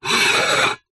household pot lid clay or ceramic lid turn rotate spin on pot